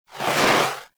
Heavy Grenade Launcher Projectile By; Warframe; Archwing

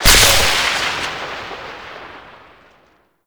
Void Storm Pillar Lightning Strike; Gameplay; Railjack; Warframe

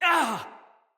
Pain, Soldier Male; Dendrit

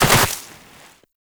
Land Soft Snow, Footsteps; Characters; Operator

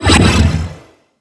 Cloak Enable; Pets; Shade

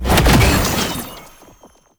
Lunge Cast; Warframe; Oraxia

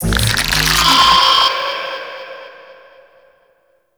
Prism Spin Activate; Enemies; Abilities; Warframe; Corpus

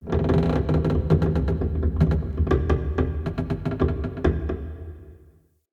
Cave Mine Wood Beam Creak

